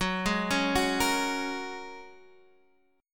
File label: Bb Minor 7th Sharp 5th Gb